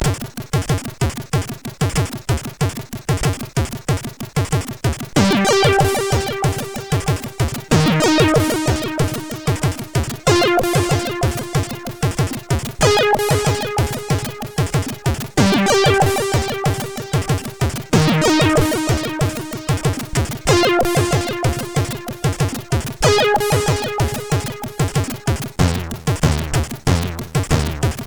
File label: Synthy; hvsc